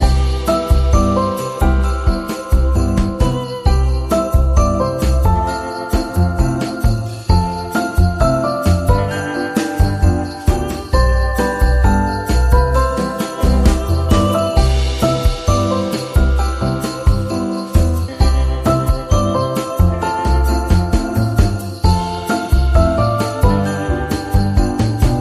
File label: Jazzy Jingle Bells